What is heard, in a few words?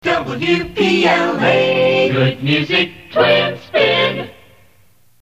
jingle good music twin spin; WPLA